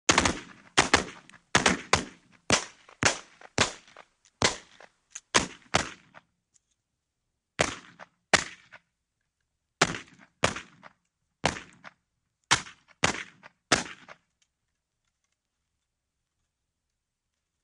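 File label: Civil War Rifle Muzzle Load, muzzle loader exterior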